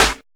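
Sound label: hipster snare; Drums In Your FACE!; HIP-HOP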